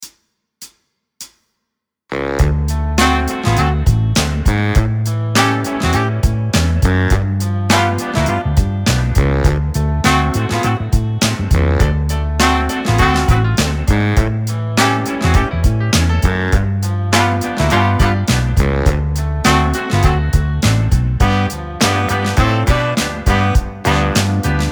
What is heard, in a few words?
road house